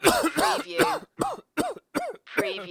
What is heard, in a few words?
Coughing, Male Cough